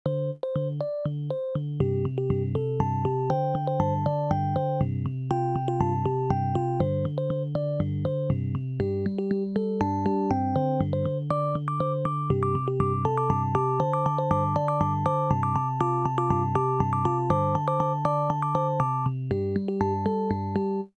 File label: epiano